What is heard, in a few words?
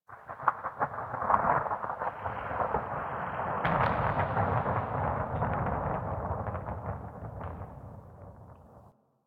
Venus Landscape Distant Avalanche; Ambience; Warframe; Venus Open World